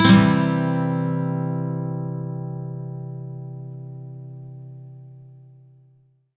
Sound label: MAJ SWEEP C, Electric & Acoustic Guitar; USB Soundscan vol.04; AKAI